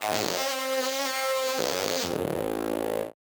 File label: Casio, Glitched, malfunctioning synth